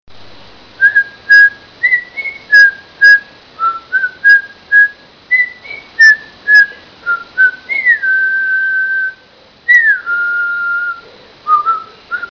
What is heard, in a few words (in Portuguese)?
Canto de Calopsita